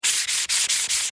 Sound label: Office Dry Erase Board Erase; Erasers (Sound Effects:Offices)